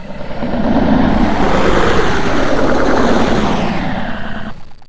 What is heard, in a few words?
alert, monsters; svencoop; AoMDC; face